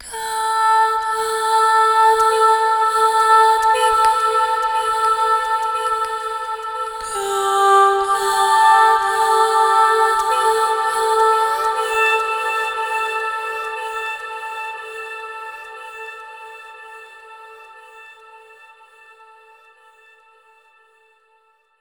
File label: Granular Vocals Long - Am 60Bpm; Construction Kits; Ghosthack; SkyRealm; Kit 29 - When The Bell Tolls